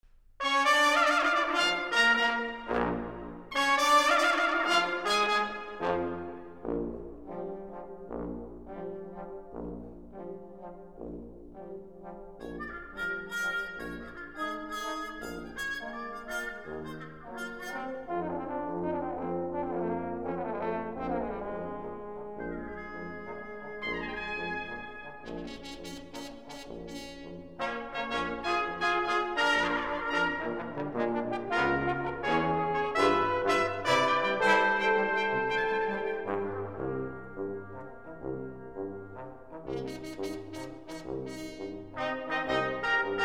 Allegro ben ritmato e deciso, Brass Ensembles; Preludes for Piano - I

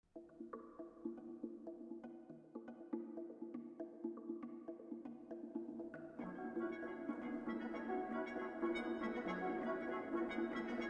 Minimal, Brass Ensembles

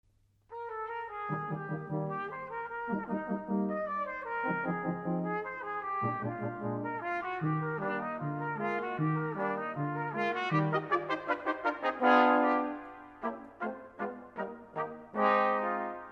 Brass Quintet Allegro Grazioso

